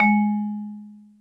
VIBE G#3, VIBRAPHONE; Greytsounds VOL-1

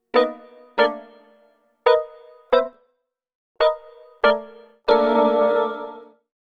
Piano Ep, Drum & Bass Keys; Sonic Foundry (Sony Creative Software); Knutrix Drum & Bass

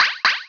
SN, EMU Orbit-3; 300 Drum Machines

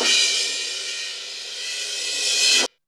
REV CRASH; THE REVERSER; Back In Time Records; Big Bang

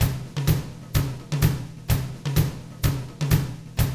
127 Drums

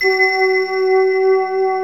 D50 Layer, Roland; 90s Sample CDs; Club-50 - Foundations Roland; D50 Syns

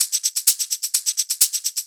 Hihat Loop 128 BPM

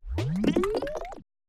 scratch, Roland MC-909

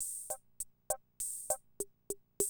CR78, DrumLoops

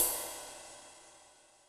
Ride siz B, Cymbal, Yamaha DTXpress; drum machine

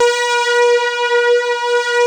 VFX SYNT, Synthesizers; Soli-Music; World of Synthesizers VOL-1

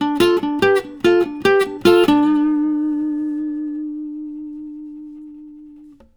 Blues Guitar LICKS; ILIO; Fretworks

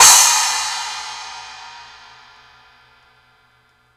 Splash One-Shot, cymbal; Bobo; KJ Sawka - Drum n Bass & Beyond; Drum Tool Box

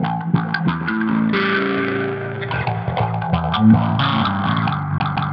Processed Electric Bass; Sonic Foundry (Sony Creative Software); Modular Electronica; Basses

